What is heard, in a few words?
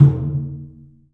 drum; The Piano; InVision Interactive